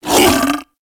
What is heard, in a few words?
Feral Vocal Leg Let Go; Warframe; Kubrow